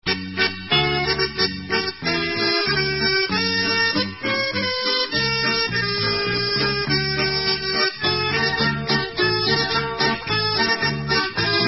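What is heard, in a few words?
Instrumental, Israeli Dances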